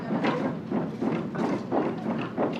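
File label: Construction Carpentry Hammering; Electa Quinney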